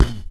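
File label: VOX KICK; Northstar - New Gold VOL-2; RAP CITY 1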